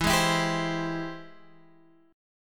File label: Ab Augmented Major 7th E, guitar